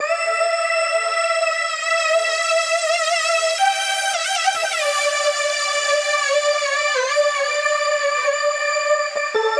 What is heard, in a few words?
E Phrase Glide Lead; Zero-G; Future Wave